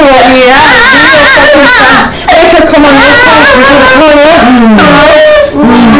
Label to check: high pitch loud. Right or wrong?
Right